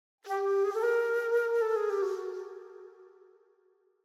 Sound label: Flute Short Light Flute Melody; Hollywood Edge; sound designer's toolkit v2